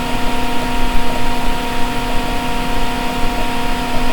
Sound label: computer open